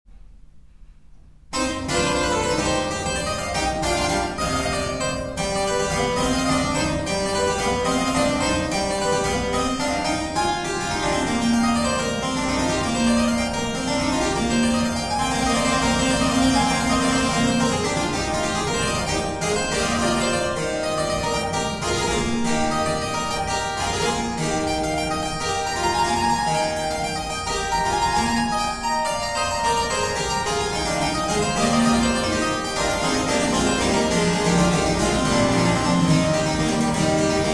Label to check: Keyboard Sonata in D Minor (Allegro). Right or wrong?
Right